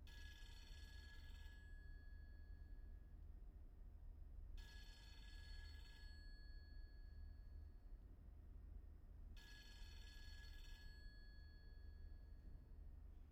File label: phone; IEEE AASP